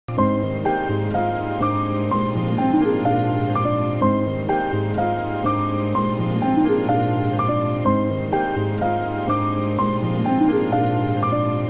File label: Trap